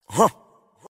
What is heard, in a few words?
grunt; meme